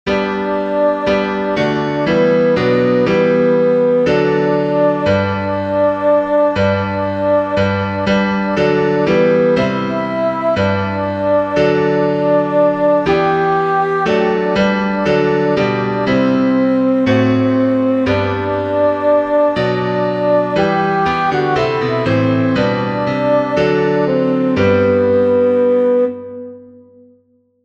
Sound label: old hundredth alto